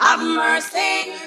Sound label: "have mercy" sung; Voice; expletives